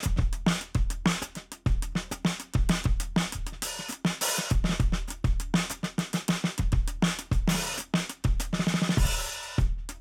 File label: Cakepop 100 bpm, drums; Basement drums vol 1